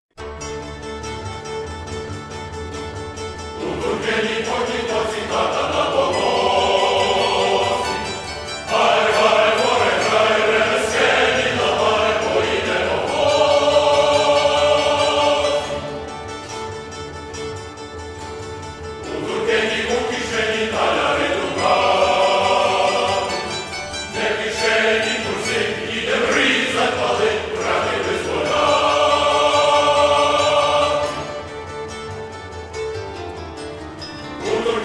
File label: instrumental